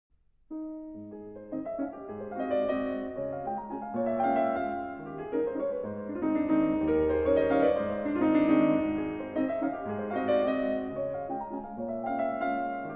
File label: Chopin Mazurka As Dur Op. 41 № 1